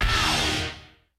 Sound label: HOUSE KIT, DRUMS; Kid Nepro; Elite